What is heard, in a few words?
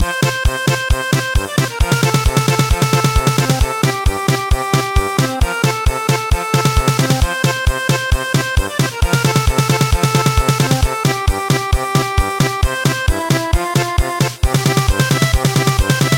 Polka German Polka; Helena Polka